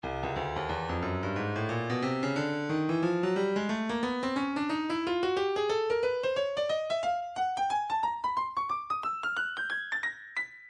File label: breakbeat 090 bpm; Stylus; Tilt